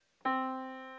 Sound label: yamaha Disk